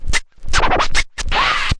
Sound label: turntable ah, Scratching and Turntablism; DRUM LOOPS and BREAKS